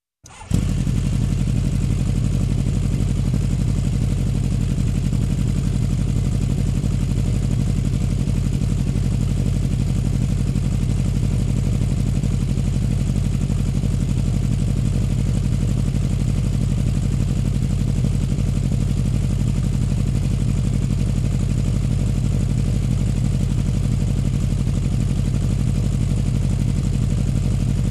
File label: Bike engine running idle